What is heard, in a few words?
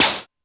Lo Whip, Drum Machines Alesis D4